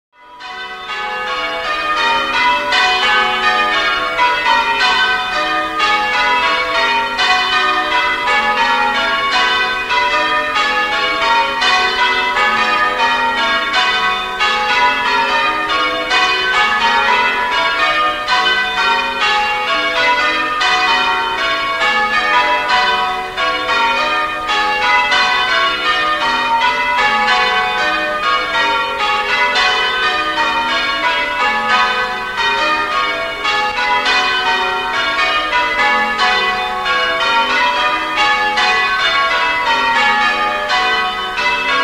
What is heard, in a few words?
bells; Extract 2 (ending the Grandsire Doubles method); Suckley church bells; mono